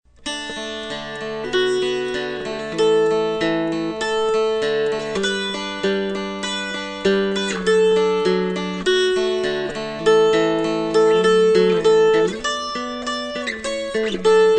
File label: dulcimer; Holy Holy Holy; dulcimer hymnal; hyms